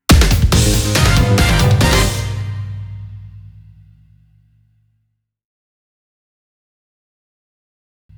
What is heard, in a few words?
Jingle - Quest - Clear